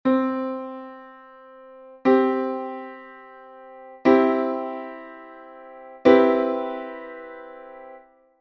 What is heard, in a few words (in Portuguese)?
triade e tetrade; musicologia